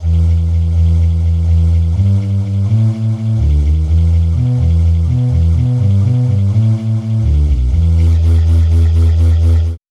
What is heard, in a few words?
piccolo bass